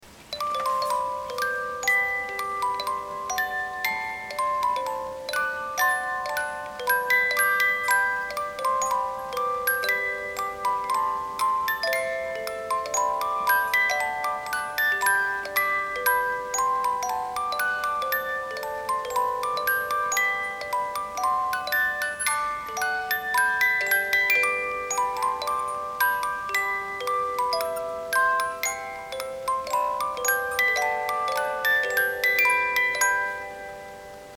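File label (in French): flutte enchantee 36lames, boite a musique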